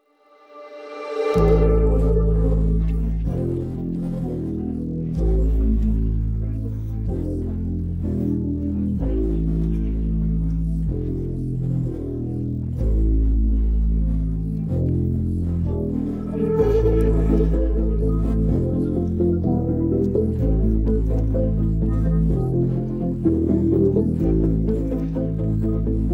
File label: Instrumental